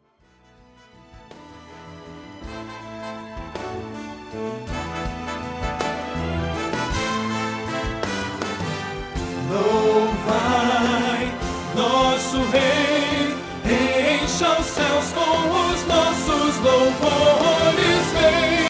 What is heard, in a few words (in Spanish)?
Tenor